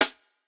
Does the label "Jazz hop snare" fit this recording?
Yes